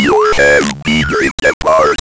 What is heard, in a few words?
Game Over